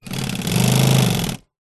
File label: industrial sewing machine operate burst walking foot; brother; zapsplat